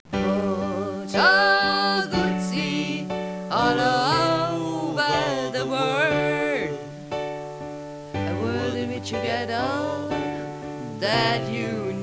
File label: jingle; guzzi